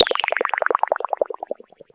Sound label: Misc Synth FX; musicradar; cartoon caper